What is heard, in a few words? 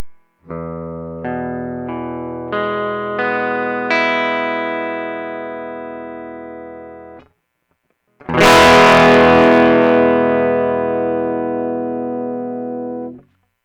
chord; e chord